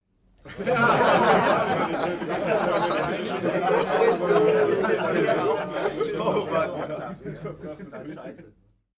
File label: male crowd happy short